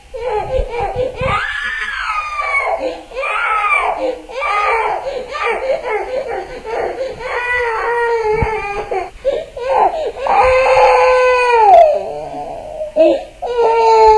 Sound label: child cryin; svencoop